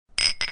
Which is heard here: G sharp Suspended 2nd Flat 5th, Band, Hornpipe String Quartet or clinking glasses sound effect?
clinking glasses sound effect